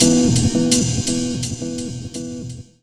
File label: Organ - 9A; Jungle; FX; Blu Mar Ten - Jungle Jungle - 1989 to 1999 Samplepack